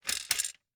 Gumball machine, metal, glass, candy, switch, mechanism, rotate, dropping, jammed; Eiravaein Works; Vaeyan IV